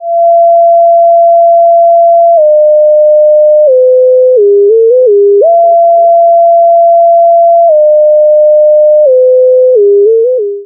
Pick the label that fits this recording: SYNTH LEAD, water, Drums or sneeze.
SYNTH LEAD